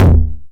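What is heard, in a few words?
909 mid tom tape saturation; Goldbaby Tape808 & Tape909; The Tape 909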